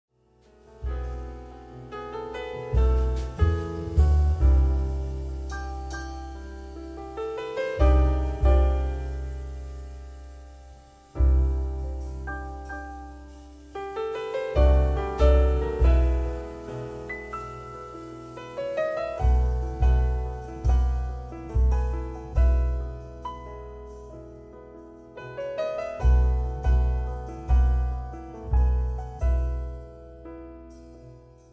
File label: trio